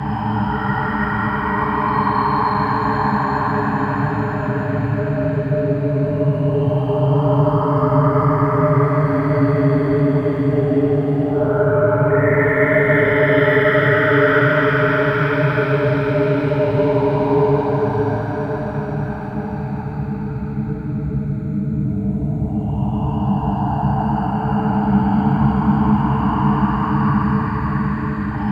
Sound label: Interior Amb, Ambience; Warframe; Orokin